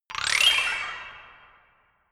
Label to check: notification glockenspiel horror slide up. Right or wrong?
Right